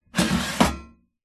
household air fryer oven metal drip tray slide out